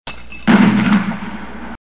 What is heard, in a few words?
mortar